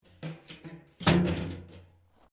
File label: Pipe Tin Move; Metal; Materials